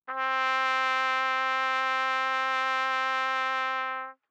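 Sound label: Trumpet vibrato ff C4 stereo, Bb Trumpet; MIS Pitches - 2014; Brass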